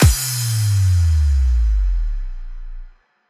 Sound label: Kick Drop, Sub Drops & Boom FXs